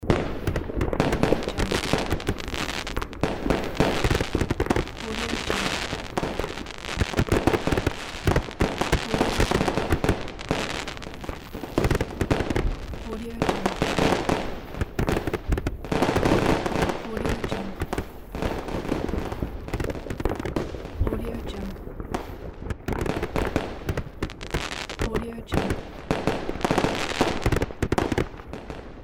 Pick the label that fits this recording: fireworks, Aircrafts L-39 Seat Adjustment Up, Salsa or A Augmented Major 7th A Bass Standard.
fireworks